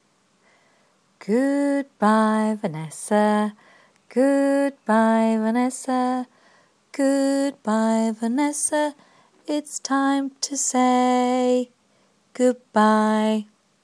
goodbye song

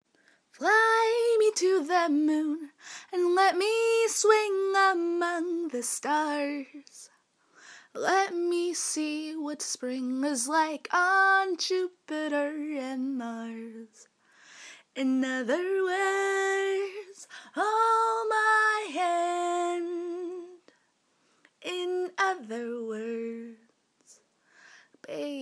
quiet singing; so we don t wake the old people sleeping

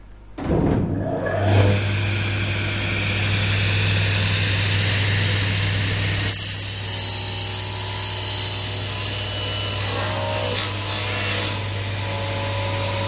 table saw in action

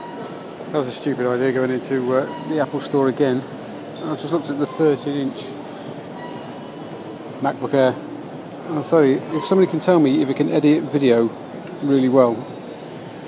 argggg

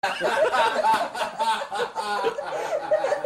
laugh

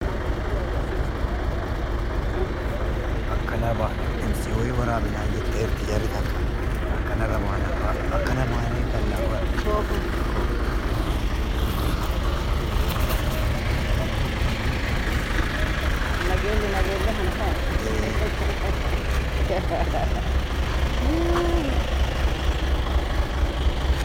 bus station noise early morning; shakiso

